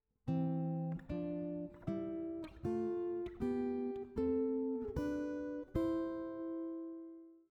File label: C Major scale in 6ths, guitar; how to harmonize a melody on guitar using 3rds and 6ths